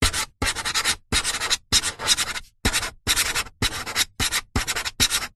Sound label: office pen writing on metal